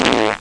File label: Gas; Education Publishing Concepts